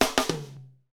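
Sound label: hip hop, Roland drum beats; Northstar - Drumscapes Roland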